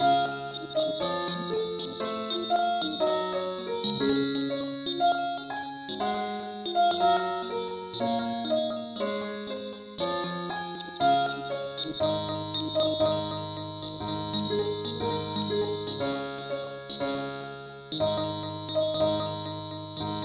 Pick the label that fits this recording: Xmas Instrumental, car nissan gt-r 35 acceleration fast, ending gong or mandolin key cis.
mandolin key cis